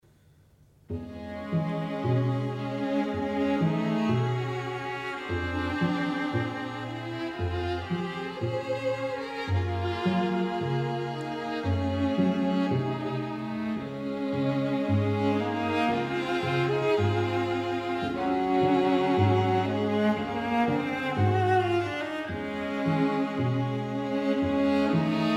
Streichquartett Andante

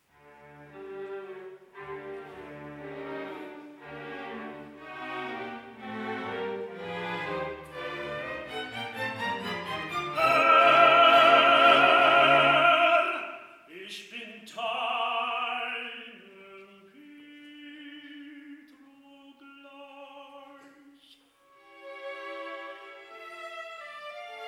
Rezitativ und Arie Tenor; II Auf dem Wasser

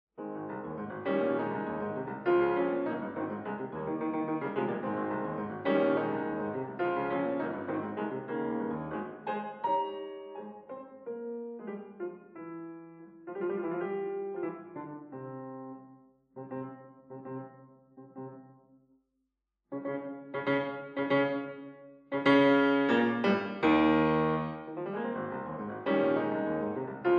Sonate

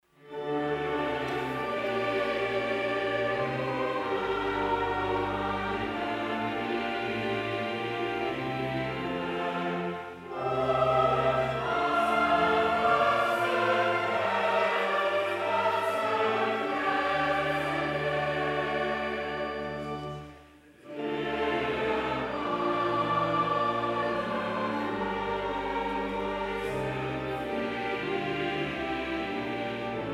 Choral; II Auf dem Wasser

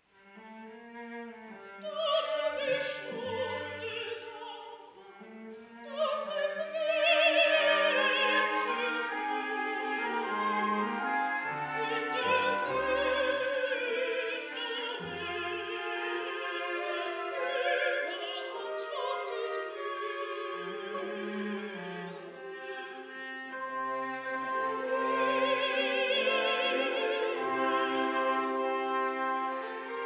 Rezitativ und Chor; IV Die Verleugnung; Erzaehlerin Simon Magd Knechte und Maegde

